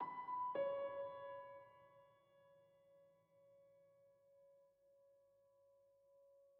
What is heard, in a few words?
Env Field Day Ground; the legend of zelda tears of the kingdom